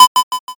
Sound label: tap